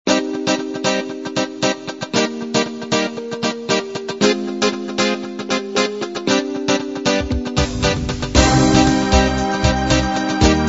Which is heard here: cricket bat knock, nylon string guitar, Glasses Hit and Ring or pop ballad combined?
pop ballad combined